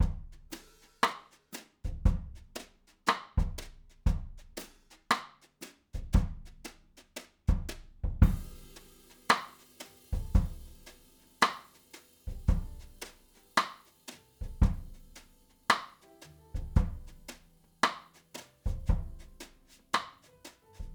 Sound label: Drums